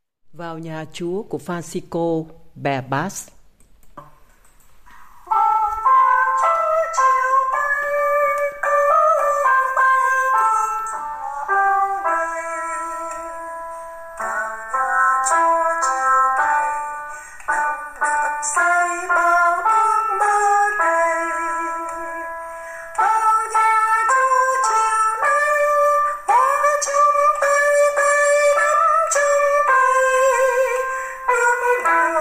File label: Bass; ca doan teresa